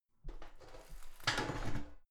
garbage can open lid soft

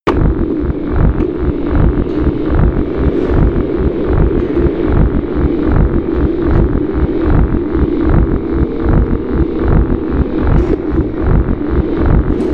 atmosphere industrial hypnotic low

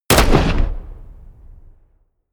shot

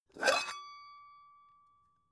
pan open

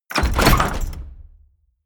medieval menu heavy open

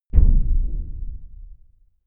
big metal impact low dull

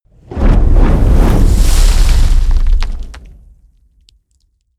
whoosh fire sizzle large

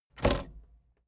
tape deck bounce

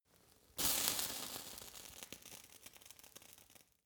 fire torch sizzle

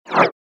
move object short

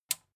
metal button tiny release enable

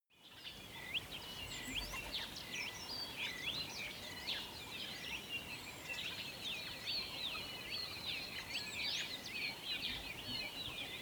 water hole early morning

